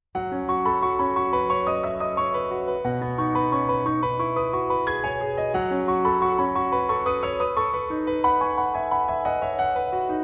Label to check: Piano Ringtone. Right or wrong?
Right